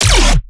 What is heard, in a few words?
BASS SHOT Lazer